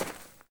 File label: grass step; Golden Crayon Sounds